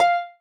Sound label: marimba rainbow; ゆめ2っき